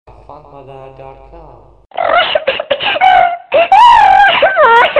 crying ghost; ringtones

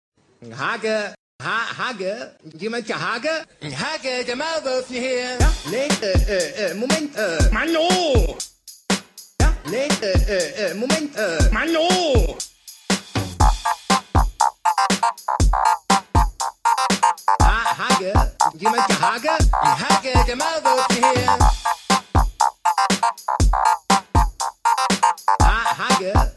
Mix Disco; Maulwurfn

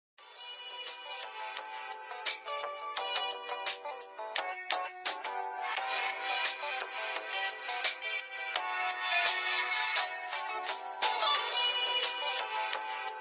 g-funk westcoast beat

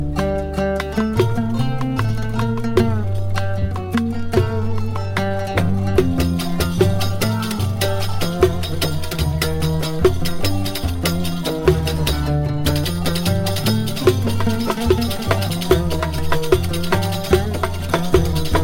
oud; classical

